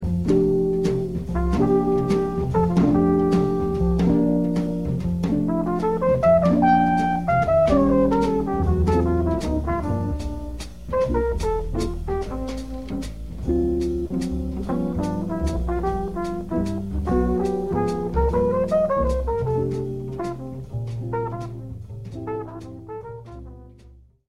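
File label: trumpet solo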